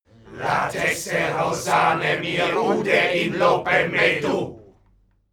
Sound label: male crowd evoke